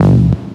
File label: Bass Short Hit